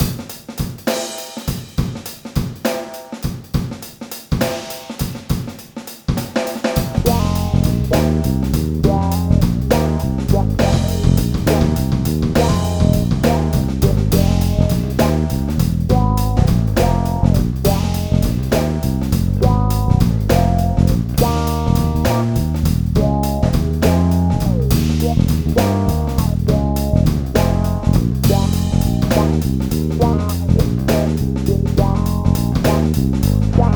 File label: Rhythm Only